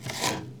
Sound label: Slice; the princess and the frog; Restaurant; Act Kitchen